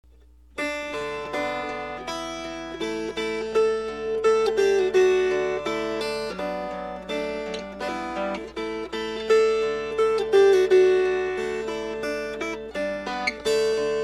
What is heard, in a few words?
Fingerpicked